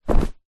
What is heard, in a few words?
foley coat jacket thick drop floor; zapsplat